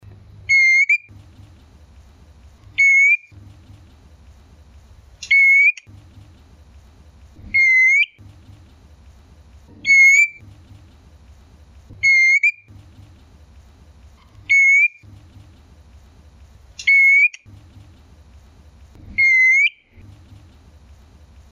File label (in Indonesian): suara murai betina